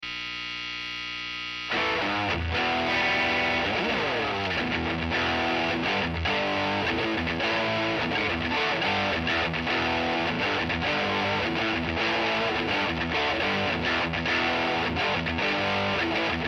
heavy

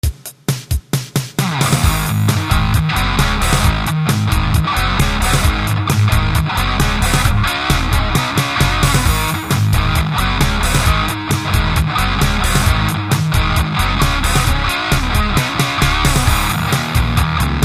firebird rear

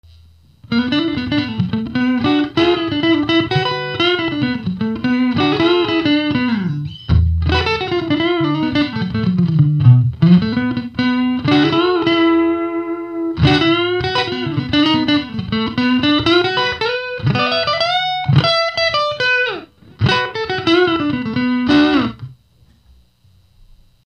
6br7 strat

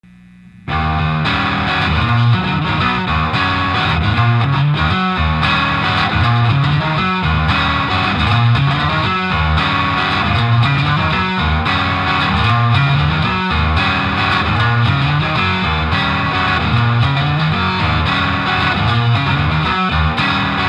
strat front

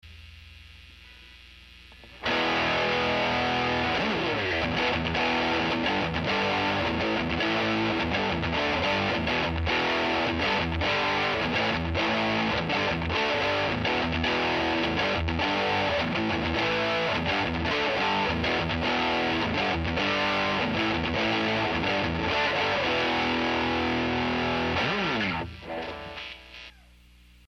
heavy

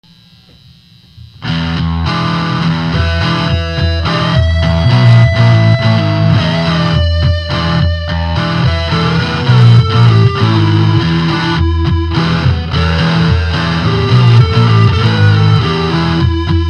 6br7 strat

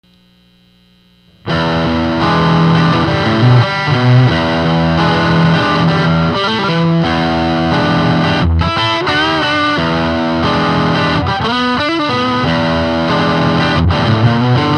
6L6GC

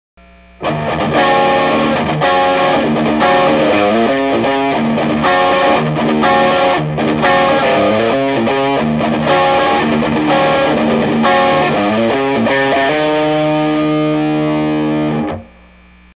flying v rear, Electric Guitars, Monkey Drive; FLYINGVリアピックアップ

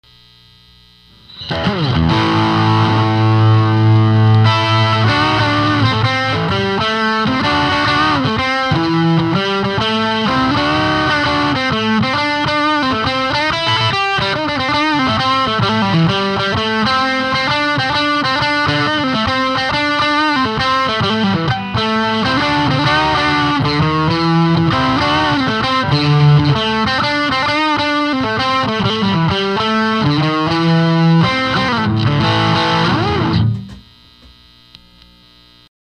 el34 altec strat

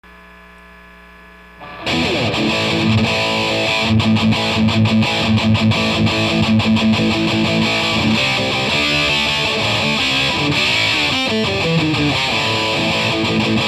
flying v

